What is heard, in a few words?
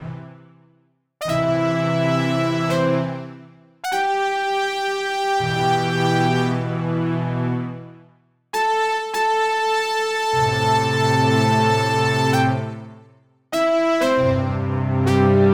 Roland SC-88; SimCity 2000